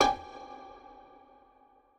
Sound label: surprise